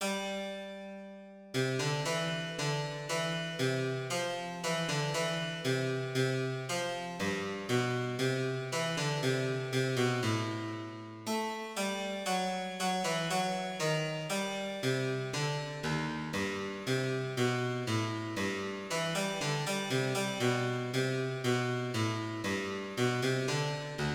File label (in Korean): 바이올린소나타